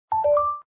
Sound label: chat